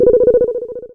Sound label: Beep